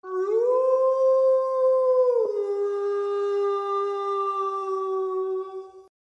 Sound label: wolf howl far away